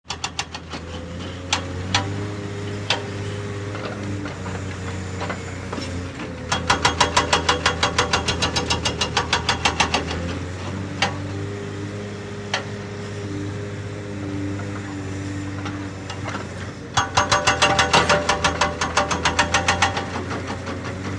Crane Interior Engine Hum w Pulley Chain Clinks & Gear